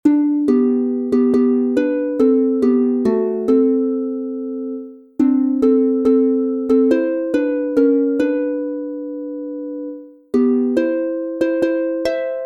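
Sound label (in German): G Dur 2 stimmig, Harfe; Zwischen´n Bouchberg und Kohlberger Ranger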